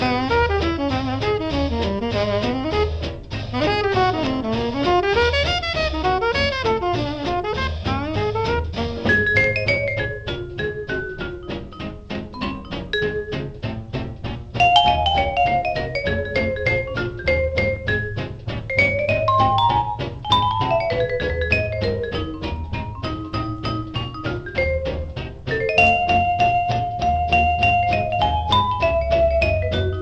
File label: jazz